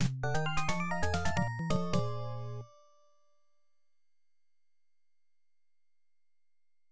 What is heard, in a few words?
Player Win; Rack 'em Up; arcade